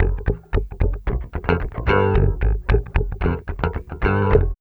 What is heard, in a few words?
BASS, Funky Ass Loops; East West